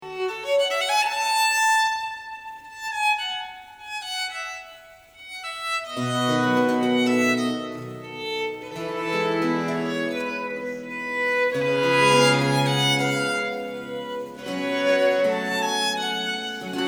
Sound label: Quartet in B Flat Major Adagio; TWV 43 B1